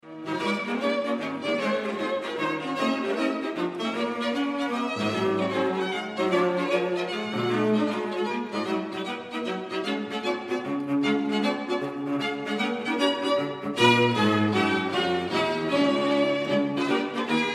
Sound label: String Quartet; Movement II